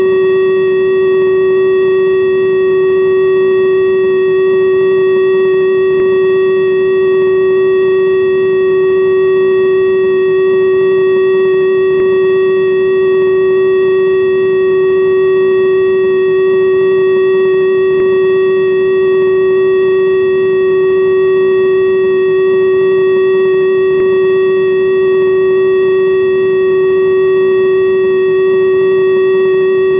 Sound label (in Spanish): Alerta amaiera Fin de alerta, sirenas; alerta riesgo quimico y sirenas